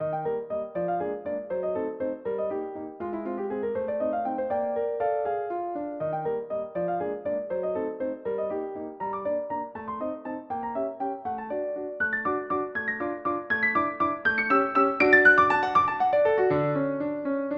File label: Valsette for piano; synth